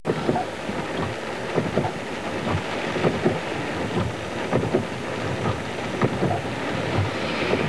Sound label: AUTO, car sounds